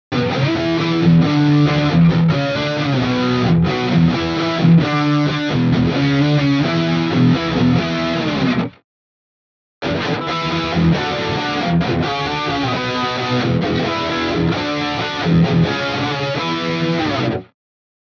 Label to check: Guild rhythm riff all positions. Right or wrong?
Right